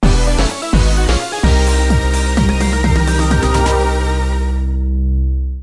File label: Jingle No loop; trauma center new blood; wii